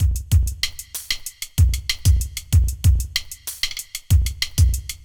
kit

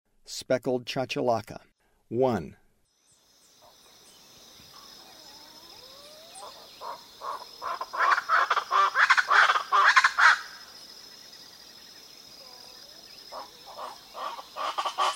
Speckled Chachalaca; S. America

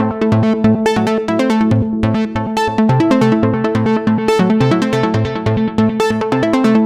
Dove Kiss, Synths Lead; Dance

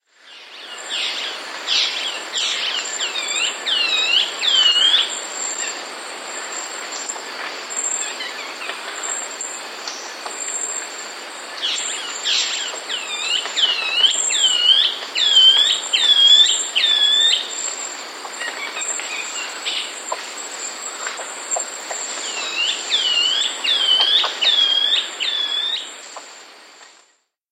Band-Tailed Antwren, Nature's Songs, S. America

